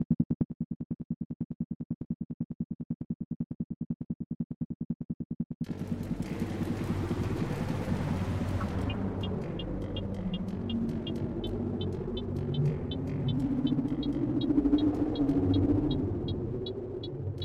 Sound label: noise; Ensemble